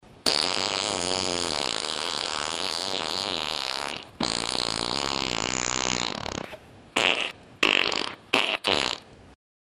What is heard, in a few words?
long dry fart; sound effect